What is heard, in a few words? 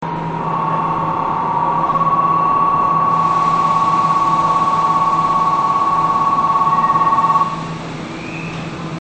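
narita, departure bell; keisei; naritakukou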